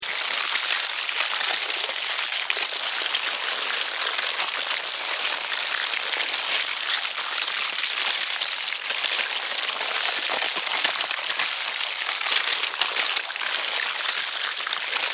Insects Colony, Insects, Colony, Devour, Carcass, Chew, Bite, Group, Attack; 5.1; BlastWave